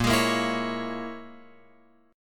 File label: Bb Minor 7th Flat 5th, Guitar, Open F; Bbm7b5 strummed